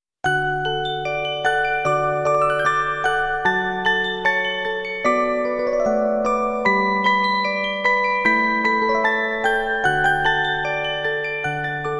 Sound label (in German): Orgel